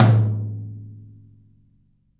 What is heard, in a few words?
open tom; 3D Sound; 3D Drums; 12 TOM